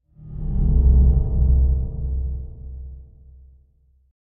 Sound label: Drone Pad Mystical Enter; Hollywood Edge; sound designer's toolkit v2